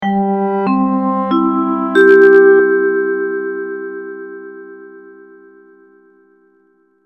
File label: Test Start Tone